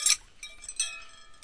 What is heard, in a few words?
83 bpm, windchimes jam, drum loops and breaks